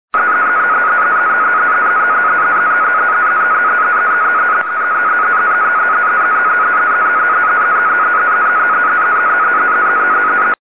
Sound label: navtex